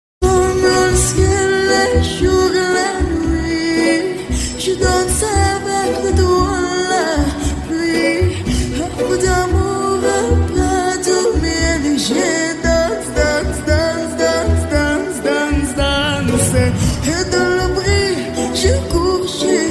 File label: joker bgm; ringtone